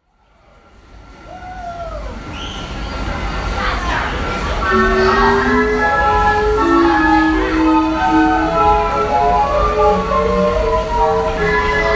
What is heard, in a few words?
organ; idlewild